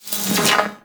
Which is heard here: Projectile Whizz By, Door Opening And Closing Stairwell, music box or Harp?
Projectile Whizz By